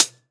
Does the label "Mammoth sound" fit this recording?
No